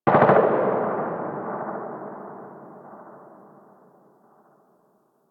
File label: Distant Gunfire; Warframe; Ambience; PVP